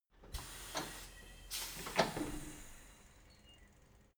tire changing machine hydraulic separator unsteady; schrauben drehen hydraulik